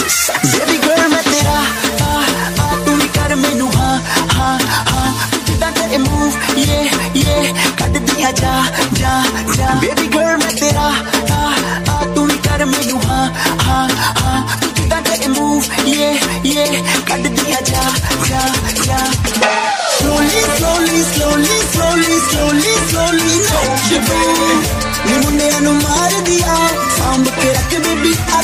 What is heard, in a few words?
bollywood song ringtone